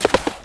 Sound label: Deer Sled run